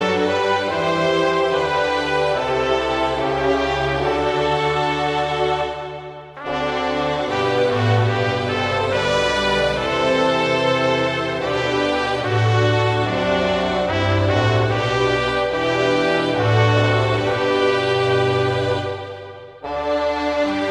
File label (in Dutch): instrumentaal